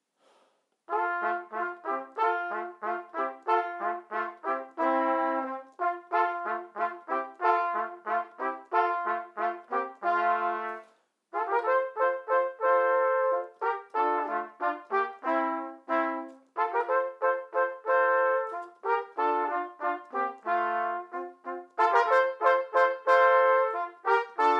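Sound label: trio with percussion; theme from renaissance festival and dances; toe excellence in chamber music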